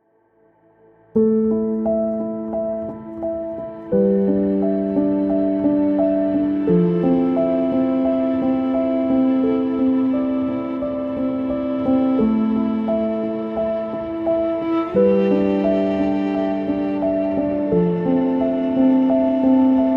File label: Classical